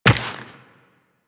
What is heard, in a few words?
Tenno Step Rock, Footsteps, Walk; Warframe